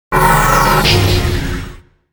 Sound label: Enchant Poison